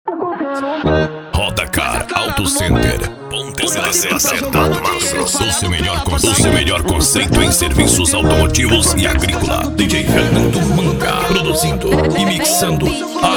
funk

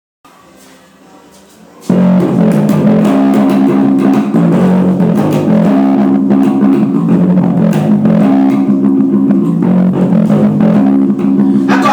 ensaios vocal e baixo

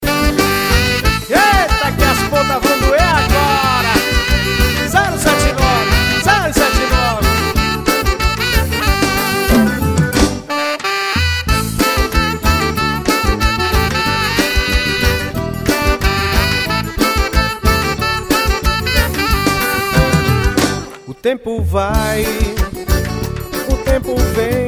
Forró

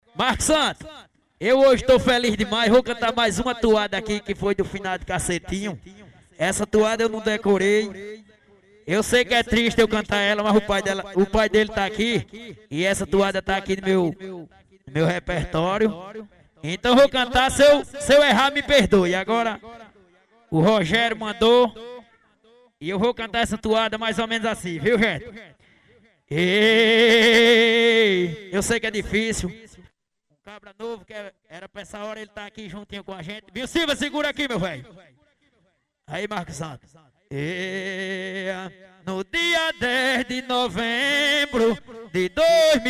toada

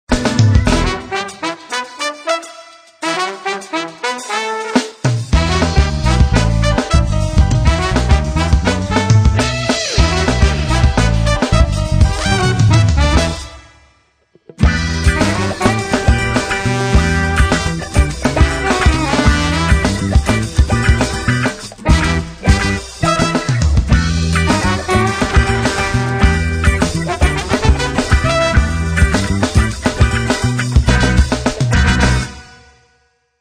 abertura solo da musica

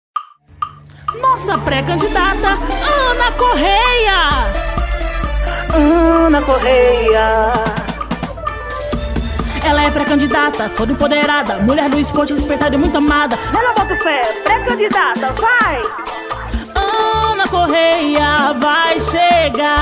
jingle; pre candidata vereadora; eleicao; campanha politica 2024

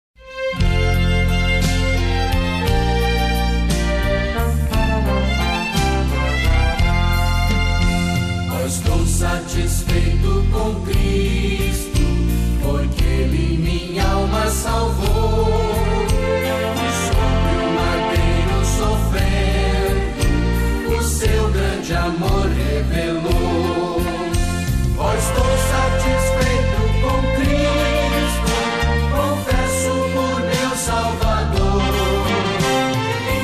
harpa crista; harpa crista do brasil

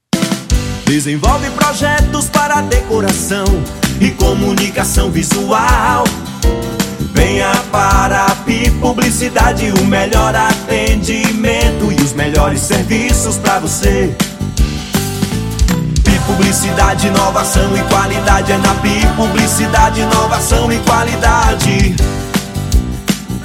Jingles

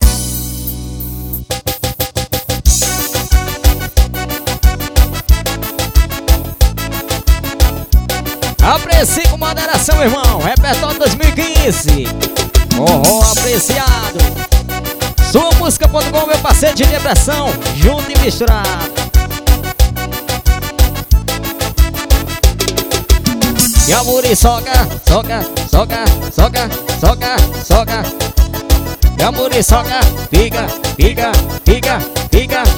forro gravando tudo ao vivo

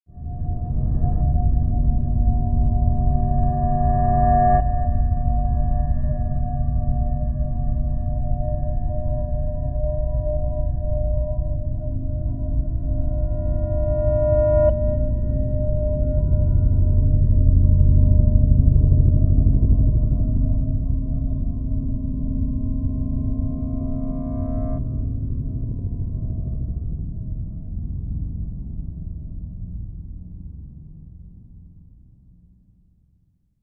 efeito sonoro misterioso suspense terror

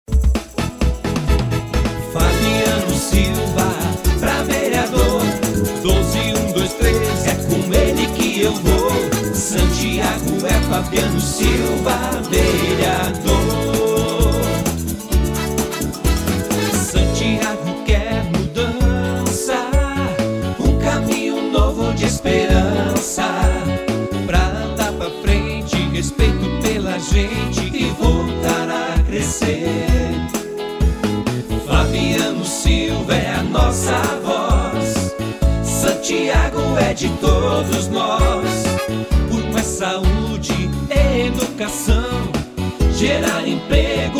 jingle inedito

